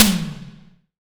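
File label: SDS V SNR, snare; Best Service; Real Mega Drums VOL-1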